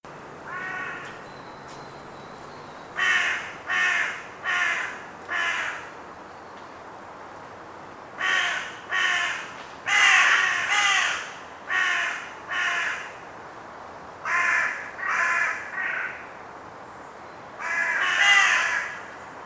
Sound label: birds